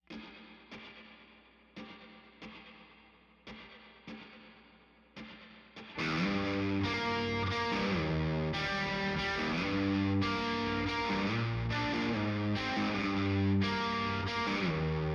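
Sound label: guitars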